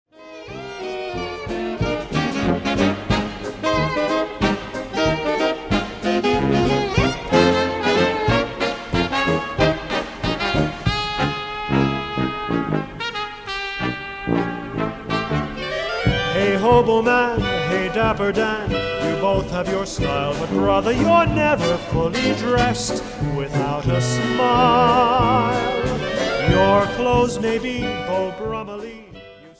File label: vocal